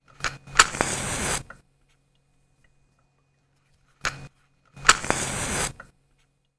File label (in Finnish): tulitikku